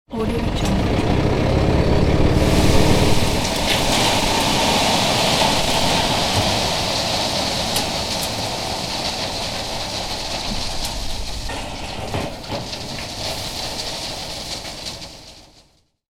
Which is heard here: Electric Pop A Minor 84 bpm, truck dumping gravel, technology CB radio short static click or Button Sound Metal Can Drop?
truck dumping gravel